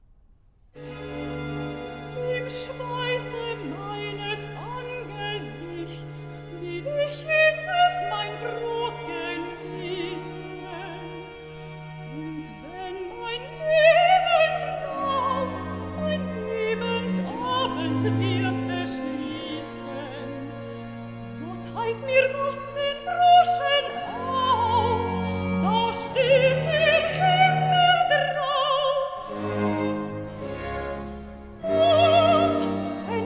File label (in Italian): Recitativo; Cantata, BWV 84 'Ich bin vergnügt mit meinem Glücke'; Bach; Naarden; Septuagesima